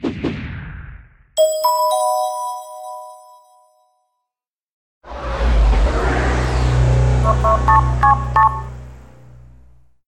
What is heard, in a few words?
Power On; Entel Bolivia